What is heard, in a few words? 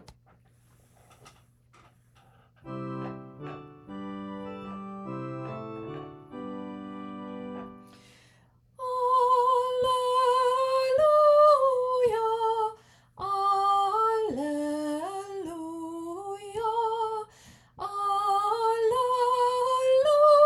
Alleluia descant Celtic; OLL choir